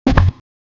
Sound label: dodging, combat, agility